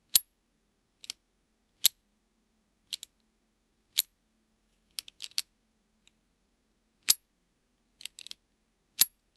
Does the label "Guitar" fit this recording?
No